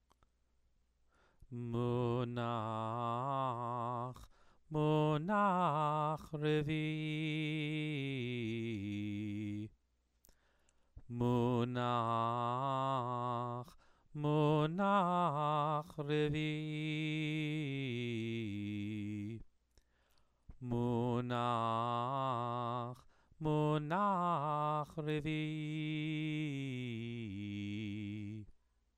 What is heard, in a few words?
Megilat Esther exercise